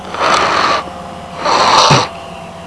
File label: evil snarl